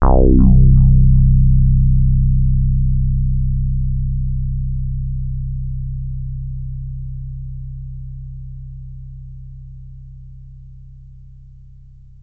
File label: Access Virus B; Synthworx